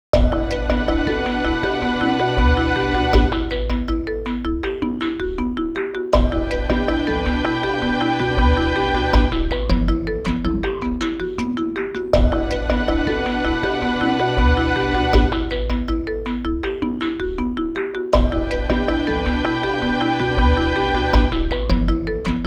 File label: Anxiety jingle; Devil Survivor Overclock